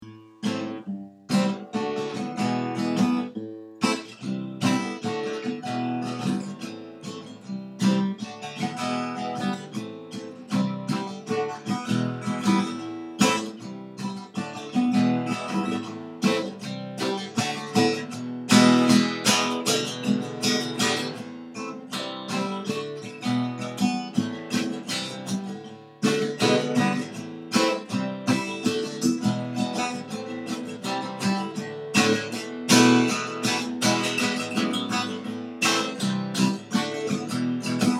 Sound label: guitar only